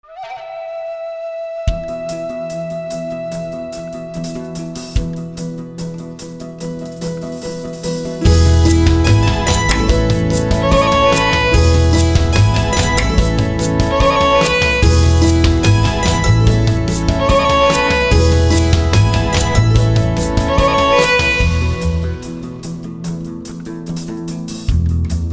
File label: Instruments Only